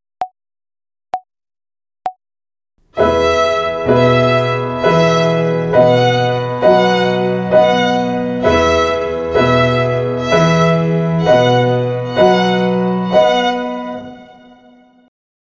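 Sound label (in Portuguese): Ginástica para o dedo 1 Corda MI Ternária, Violino; Violino para os Pequeninos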